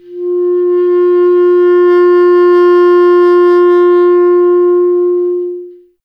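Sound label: wind bass clarinet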